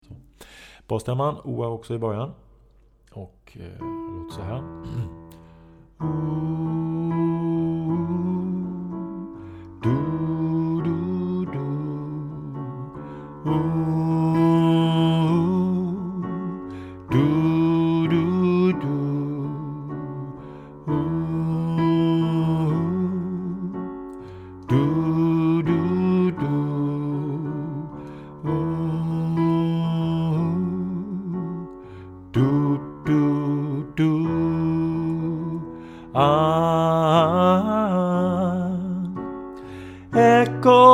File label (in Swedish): bas